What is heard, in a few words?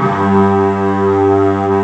STRINGS G1; Big Fish Audio; Synth City